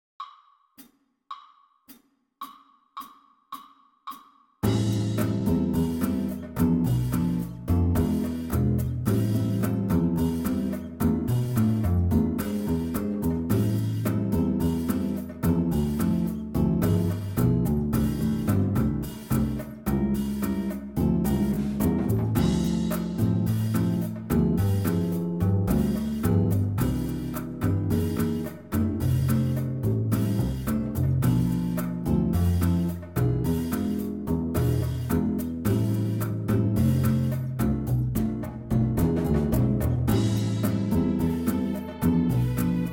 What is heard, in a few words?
TENOR Trb PLAYALONG Jazz Etude BOSSA; All-State; Jazz-Band